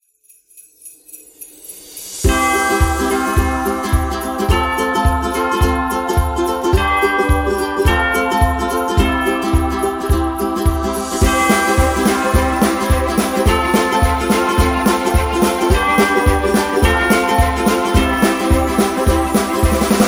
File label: Christmas Happy; snow